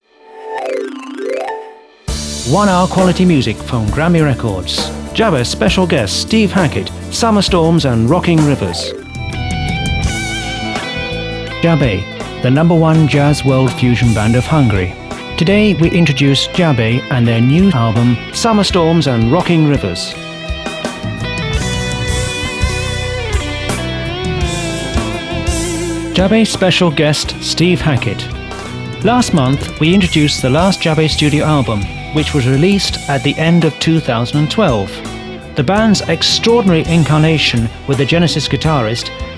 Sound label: One hour quality music sample